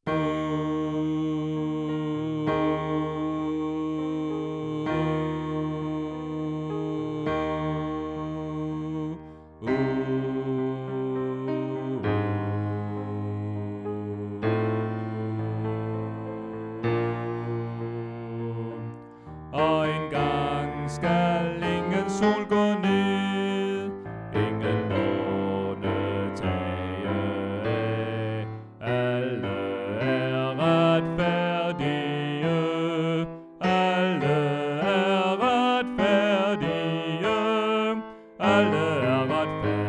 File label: Bas